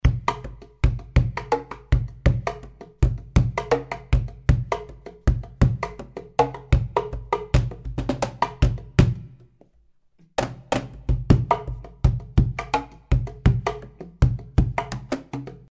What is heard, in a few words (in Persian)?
صدای کاخن